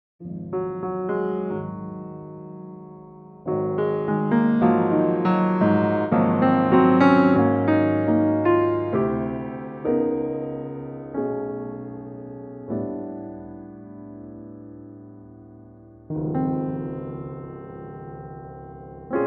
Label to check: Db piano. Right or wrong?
Right